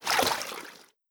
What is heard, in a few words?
Water splash